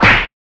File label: kick, weapons; midnight guns